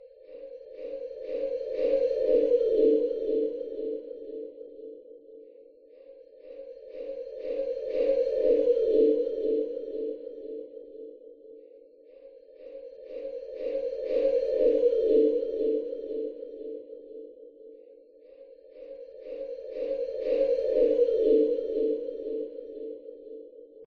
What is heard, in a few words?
Scary Sounds